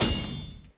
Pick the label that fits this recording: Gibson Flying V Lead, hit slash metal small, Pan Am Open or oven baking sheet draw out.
hit slash metal small